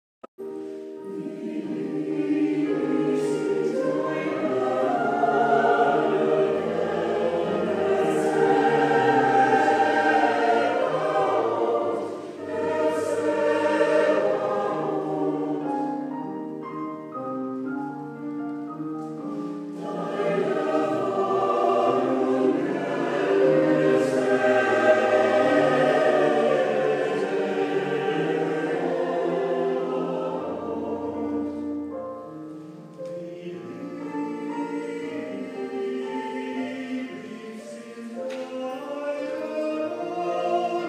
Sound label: Lyric Chorus